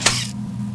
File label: change, weapons